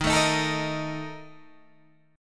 Bb Major 7th Suspended 4th, Eb, chord

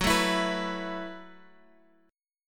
Gb Minor 11th Gb down Guitar Open F; Gbm11; strummed

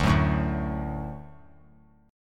D Major D down, D Chord; D strummed; JGuitar

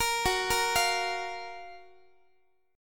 F sharp Major 7th, Guitar; F#M7 Chord; arpeggiated; x,x,13,16,14,16

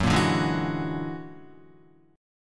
Gb Augmented 9th Gb down Guitar 4F,4A,5C,5G,5C,6E; Gb+9 strummed

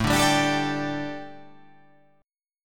A 7th Suspended 4th, A, down

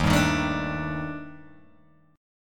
D sharp Major 7th Flat 5th, down, Guitar, Open G

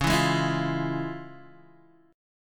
F Minor Major 7th Sharp 5th, C sharp; FmM7#5/C#; strummed; JGuitar

